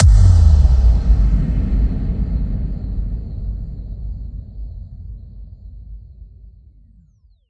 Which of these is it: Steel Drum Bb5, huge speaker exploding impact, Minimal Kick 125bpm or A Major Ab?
huge speaker exploding impact